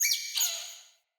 Vocal Double Squeak, Animals; Player; Player Floppet

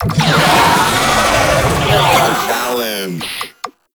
Idle, Vocals; Warframe; Proto Infested; Babau

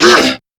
Pain Crewmen; Dialog; Barks; Battle; Warframe; CorpusCrewmen; CrewmanA

